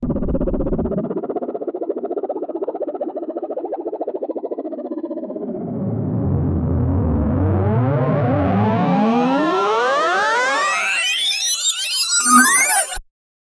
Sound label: futuristic 3 parts poppin